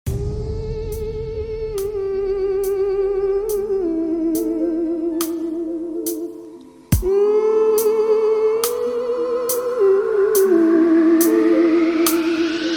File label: bass drop dubstep